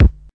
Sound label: house Kick; House Kit; STYLE KITS (Hip Hop, Etc); Choosy House Kit